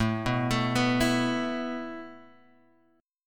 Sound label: A Suspended 2nd A Guitar Open G